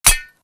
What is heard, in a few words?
imp bullet light ballistic titan; Titanfall 2